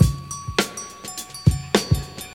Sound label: 69 bpm drum loop A sharp key, kick tuned to the A# note